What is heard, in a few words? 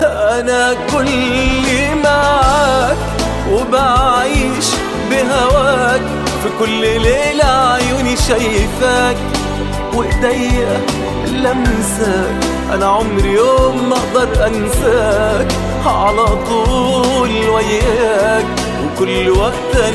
New Arabic Song Love